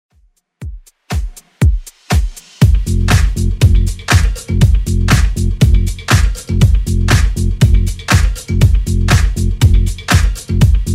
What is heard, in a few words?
Redrum 120bpm